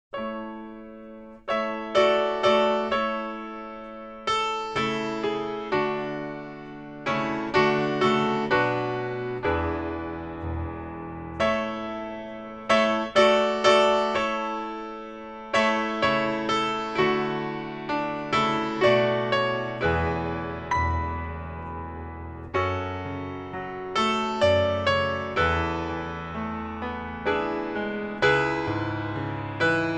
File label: Instrumental, Piano